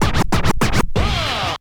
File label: scratch; Graz